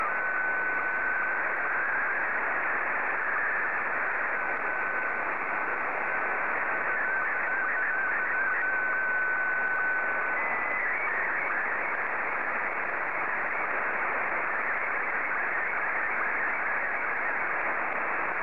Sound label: sstv